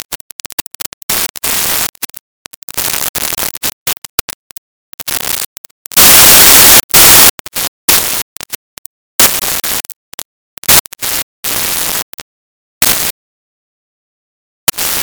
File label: Bad Radio Noise